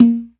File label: PERC, ORGAN; Keith Emerson Signature Series